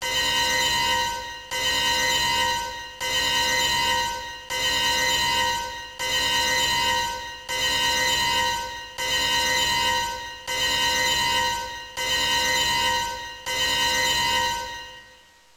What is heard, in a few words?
Continue; Aqua Jack